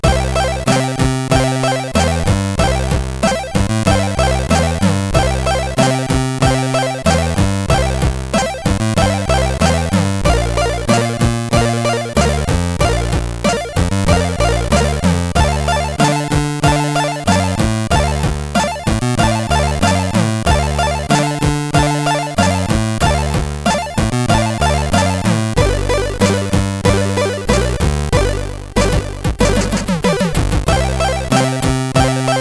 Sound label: Slow Rock, sid, CSG8580R5